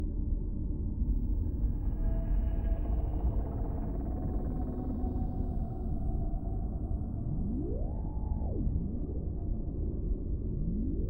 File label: TARDIS ATMOS